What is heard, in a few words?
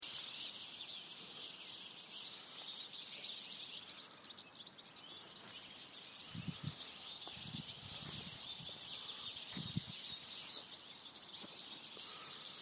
Electrified Environment